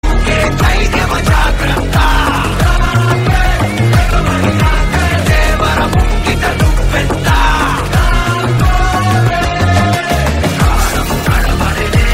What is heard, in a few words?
bgm; devara